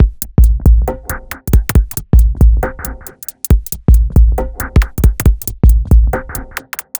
Bpm137 Break, Dubstep, Drum Loops; Eggo

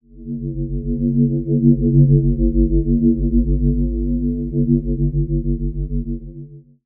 SOUND SYSTEM G0, dubstep; Loopmasters; Jack Sparrow; Deep Dubstep Progressions

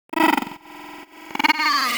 126 D VOCAL LOOP, Funky Underground; Loopmasters; Funky Underground Vol 2

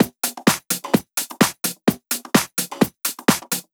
Loop, 128 BPM

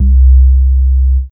Bass Shot C

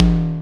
tom, One-Shot Drums; Dubstep; Rimshots & Toms

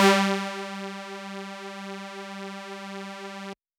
Dirty Dutch Lead G2

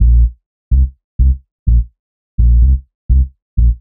126 Bb C BASS, Funky Underground bass loops; Loopmasters; Funky Underground Vol 2

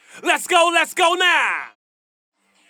VOX

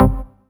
Synth Shot C

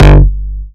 Bass Shot G; Mutekki Media; Vengeance Electroshock Vol.2; Bass Shots

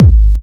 Kick

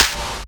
Clap, Dubstep One-Shot Drums

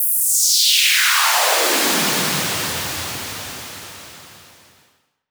FX Downlifter

